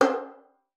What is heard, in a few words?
FING C5, VIOLIN; Xsample VOL-5